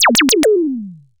Disco Zappa, sci-fi

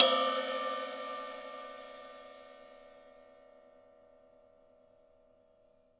CYM, Real Drum Kit, SWING KIT; Best Service ProSamples vol.43 - Real Drum Kits

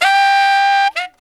SAX LICK, BRASS LICKS; Ueberschall; Jam Box

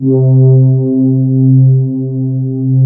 ARP, Analog Fantasies; USB Soundscan vol.49; AKAI

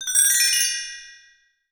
CHINESE, BELLS CHIMES; Voice Crystal - Steve Reid Definitive Percussion

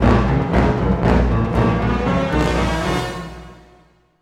GRO, Orchestral Loops; 128 COAST ROAD

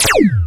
JV KIT; Pro-Rec - Synthsonic; JV ANALOG1

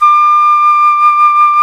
jazz flute

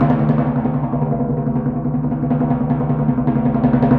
Timpani, Orchestral, Rock Pop; Sonic Foundry (Sony Creative Software); Orchestral Series VOL-4 - Rock and Pop

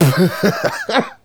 Male Laugh; Laughs; Sonic Foundry (Sony Creative Software); Vocal Toolbox

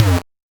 Noise, 8-bit, Bitty Noises

